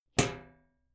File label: club hit sheet ring soft